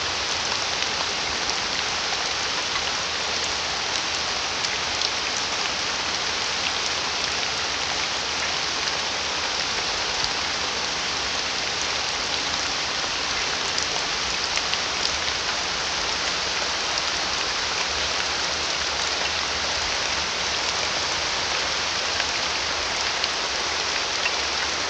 White Noise Waterfall

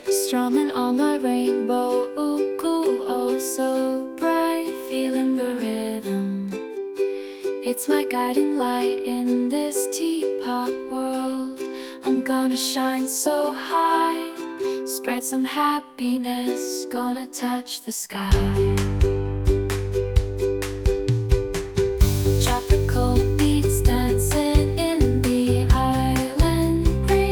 Tropical Beats

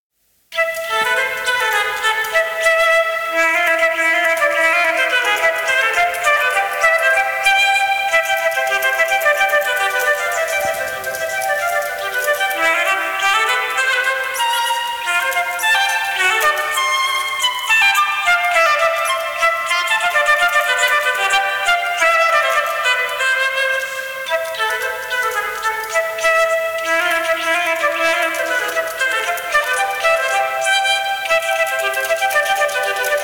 JS Bach, A-Moll, Bourre anglaise; JS Bach sonat i A-Moll

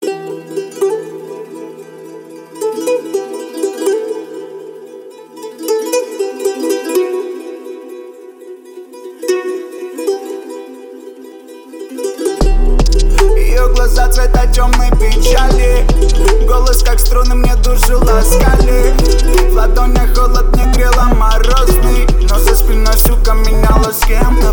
33 35 37 Hz Low bass

